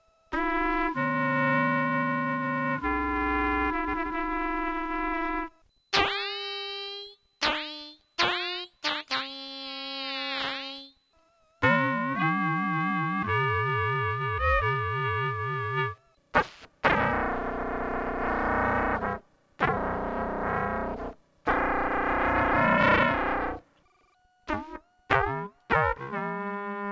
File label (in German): Trompete Mix